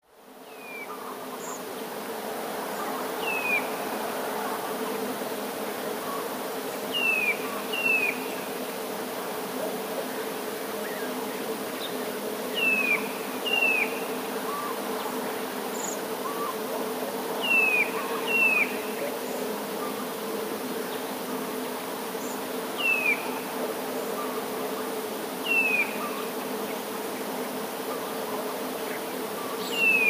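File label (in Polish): kowalik nawoływania; Laskach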